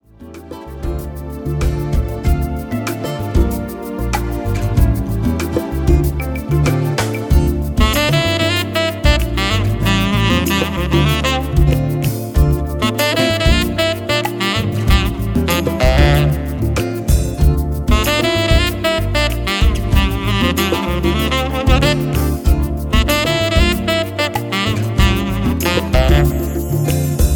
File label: Saxophonist Latin